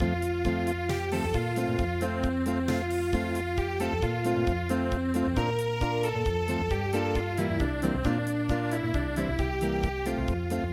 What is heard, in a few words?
Unplugged, midi